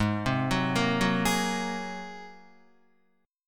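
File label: A flat 9th A flat Guitar, chord; Ab9; 4,3,4,3,6,3; arpeggiated; 4E,4A,5D,5G,5C,6F; chordshape; JGuitar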